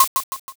card flip

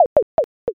dice

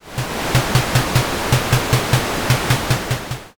train run

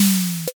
drawer

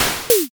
fish jump

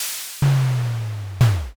hatch

